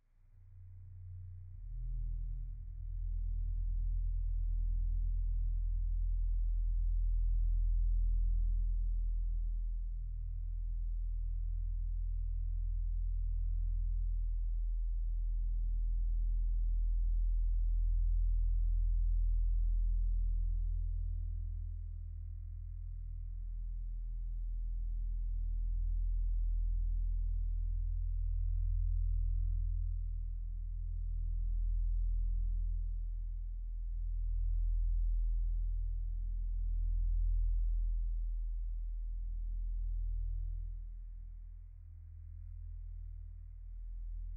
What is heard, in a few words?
hum